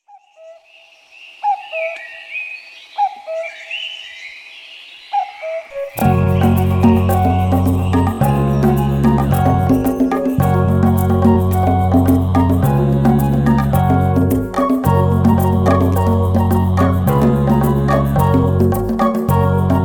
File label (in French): foret lointaine bande instrumentale; oiseaux en musique